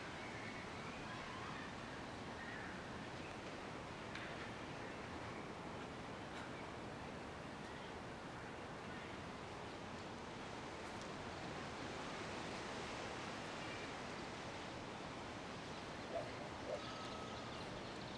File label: Park Soundscape